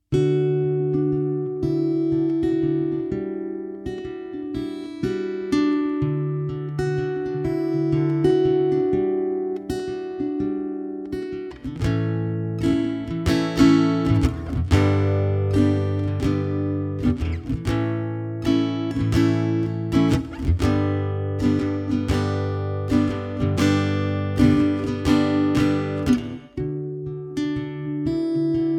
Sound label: Acoustic Guitar Melody Fingerstyle